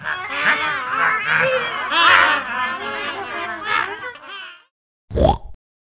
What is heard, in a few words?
birds boss; Angry Birds